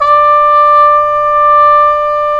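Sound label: brass cup mute trumpet, dry; Roland LCDP12 Solo Brass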